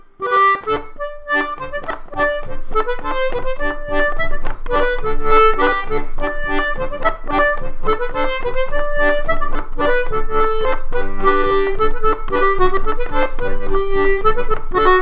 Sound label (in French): bourree 3 temps; La Glauda; Grand Orphéon